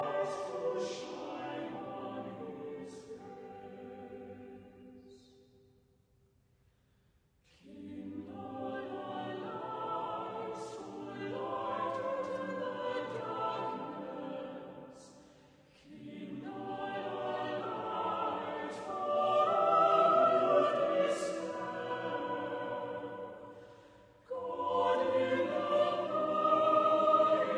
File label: carols